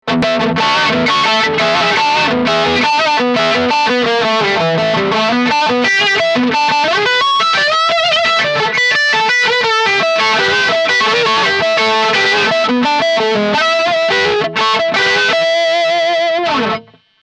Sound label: Wah-like Tone Effect; Maxon FL9